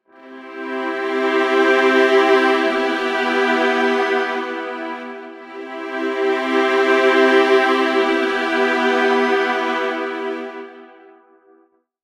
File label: I-iii; altered chords; the chord turns into iii with it's 5th tone in the bass